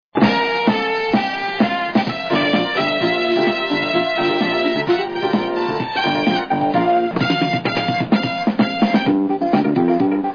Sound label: dance